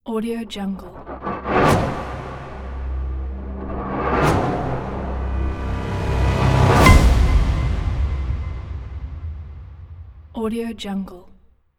transition logo; docwaxler

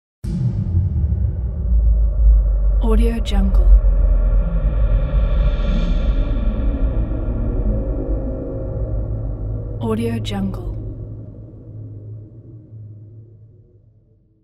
intense underwater sound

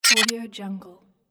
advanced tech access denied

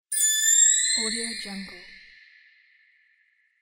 magic transformations

